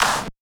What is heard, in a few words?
clap snare; Anthemic